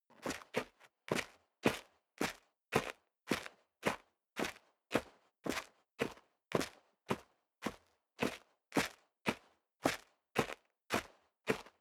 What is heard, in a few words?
step sneaker concrete grit upstairs sequence; schritte